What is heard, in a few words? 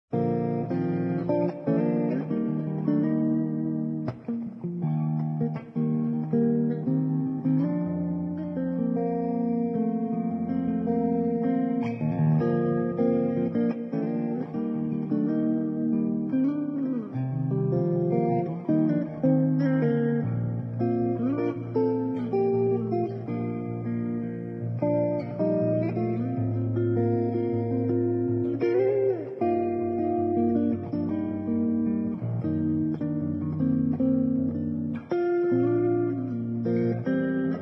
Guitar Electric; Instruments